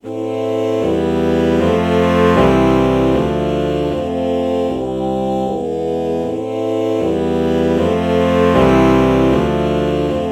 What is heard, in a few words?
alto saxophone oh chord morph